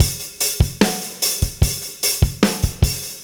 Beat Loops, 074; Zero-G; Monster Beats

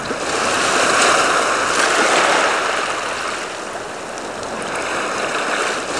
CALM SEA, ESTUARY; Zero-G Ambient 1

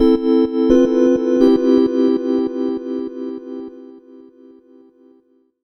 Chime Loop

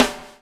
BUBL, BUBBLE; Spectrasonics - Backbeat

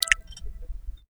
SLAP NOISE; USB Soundscan vol.18; AKAI; Funky Vocals

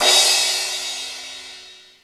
POWER CRASH, MPC-60 DRUMS; Greytsounds VOL-1

